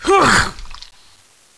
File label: Pain Female